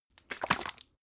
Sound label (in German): bottle syrup shake single, gastronomie